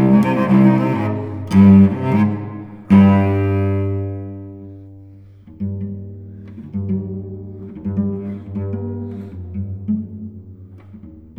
Suite for solo cello, Dialogo; Britten; Violoncello Solo

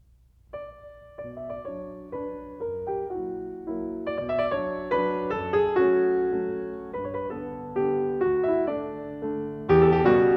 Mazurkas for piano, No. 2 in B minor; Chopin

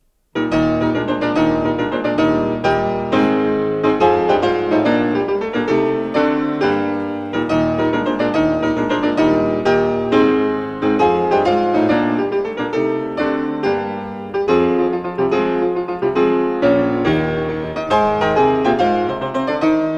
for piano, Rasch; Schumann; Waldszenen (Forest Scenes); Jagdlied

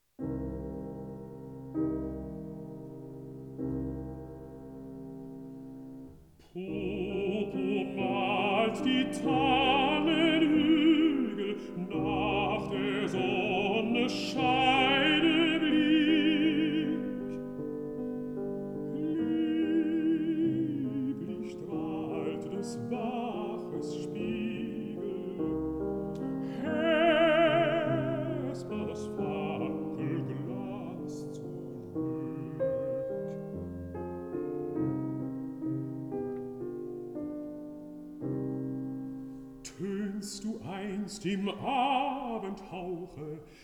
song for voice & piano

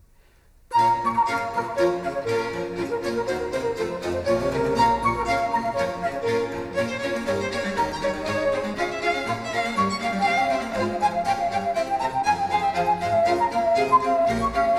Orchestral Suite in B minor Badinerie; BWV 1067; J.S. Bach; 4 Orchestral Suites

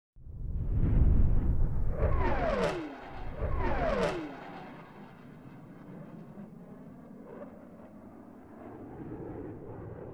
Jet flyover FA-18s; Sound Effects; USMC MkLk, Bangalors; Surround Spectacular

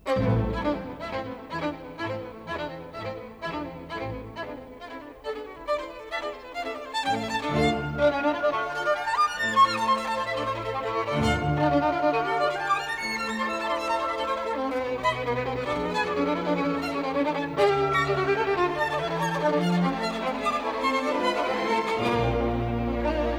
Violin Concerto, A minor, Allegro con fuoco; Bruch,Vieuxtemps

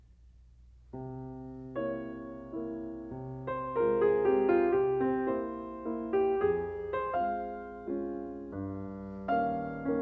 Mazurkas for piano, No. 2 in F minor; Chopin

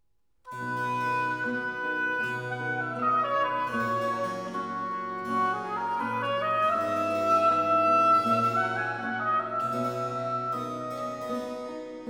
Sonata for oboe & continuo in A minor; Andante; Vivaldi, Telemann; Oboe Sonatas

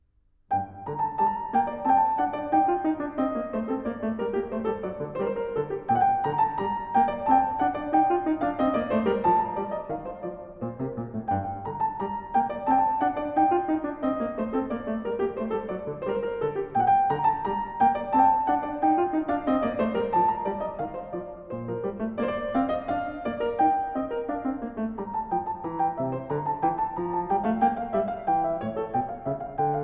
English Suite in G Minor, Gavotte; BWV 808; Bach; The English Suites

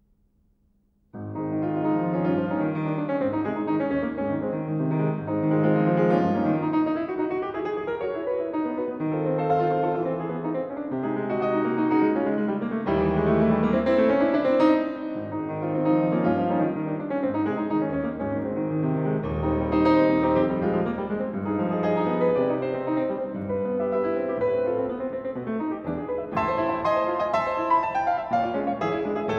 Improvisation for piano in A flat major; Improvisation No. 4; Presto con fuoco; Poulenc

